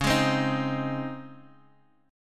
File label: Db Major 7th Suspended 2nd, Guitar Half Step Down